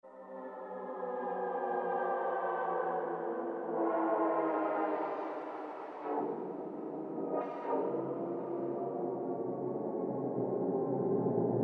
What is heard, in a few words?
Time Warp, Roland JD-990; Vintage Board